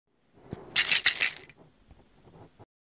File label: Pill bottle